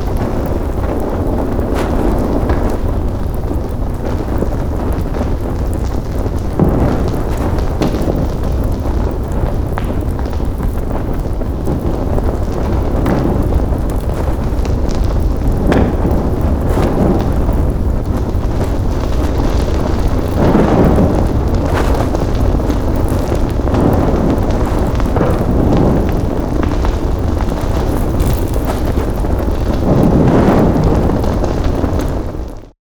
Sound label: DOORWAY BURNING INSIDE, Fire sound effects